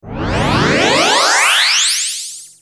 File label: scifi